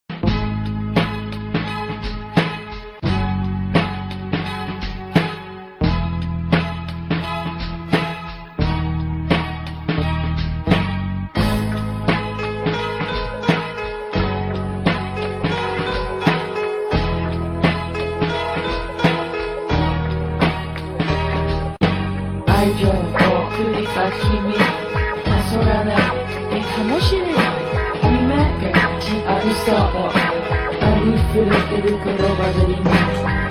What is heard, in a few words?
Japanese Rap